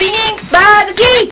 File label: fight